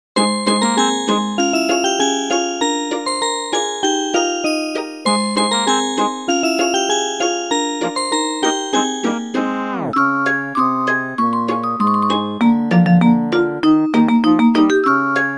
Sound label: musicbox; children; anpanman